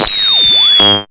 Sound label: NOIZ FALL, Hard & Loud Techno; USB Soundscan vol.01; AKAI